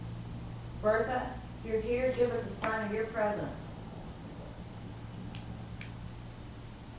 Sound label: clap response